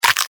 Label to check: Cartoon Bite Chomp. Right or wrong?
Right